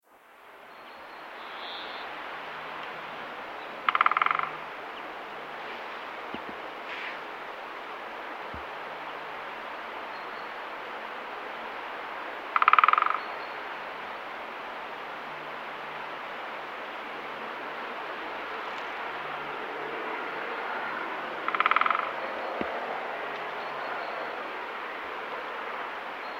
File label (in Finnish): kapytikka; janakkala; laurinmaki